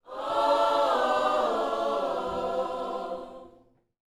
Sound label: Vocal, Gospel, PHRASE; Spectrasonics